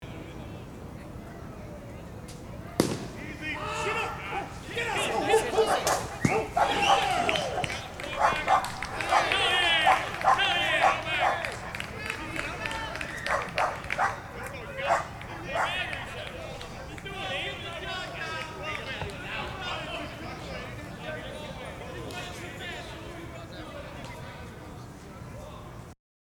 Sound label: 16 inch softball home run, field recordings